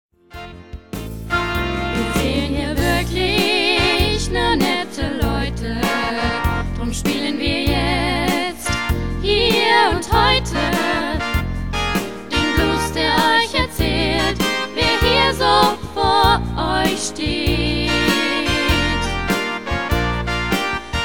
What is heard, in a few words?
blues; Vorstellungsblues